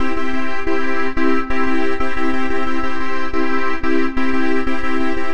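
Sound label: Club Beat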